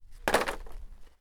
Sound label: Dropping Wood